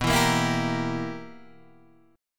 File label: B Major 7th Suspended 4th Sharp 5th Guitar Open G, chord